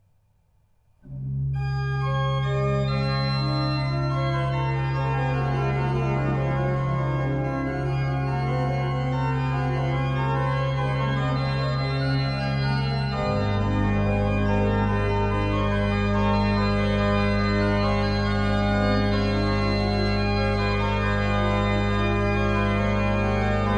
Ariadne Musica Praeludium et Fuga I, Organ Music from Bohemia; J. C. F. Fischer